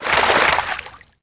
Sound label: SWIM WATER SURFACE